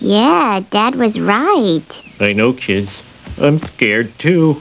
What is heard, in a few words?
scared; Simpsons